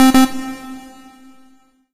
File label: lift warning; video games; doomsday; source engine; tf